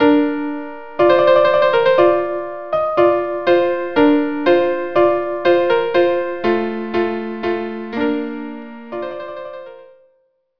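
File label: violin unaccompanied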